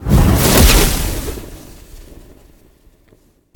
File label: firewheel; smite; god merlin